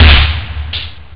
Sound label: snarechh; labrat; jazz jackrabbit 2